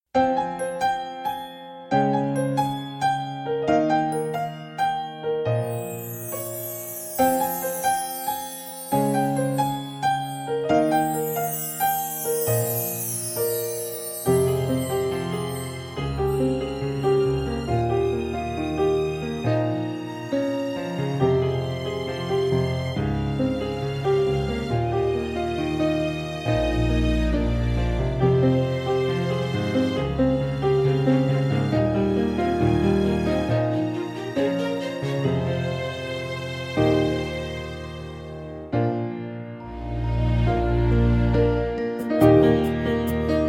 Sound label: Backing